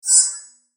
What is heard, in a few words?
Rollover Shimmer Metallic; Rollover Shimmer High Metallic; Mouseover; Multimedia; Blastwave